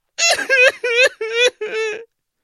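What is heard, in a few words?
sounds of male crying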